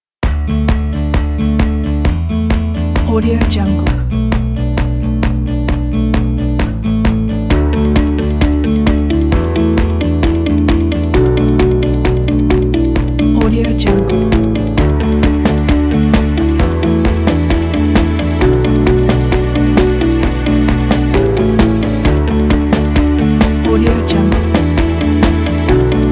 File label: motivational upbeat indie folk